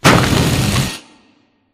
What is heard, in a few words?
Small Concrete Wall Start; Boomtown; Titanfall 2